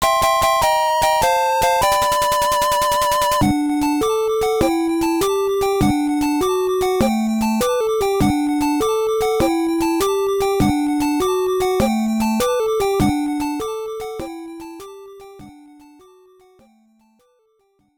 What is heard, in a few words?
Stage Clear; hello kitty world; nes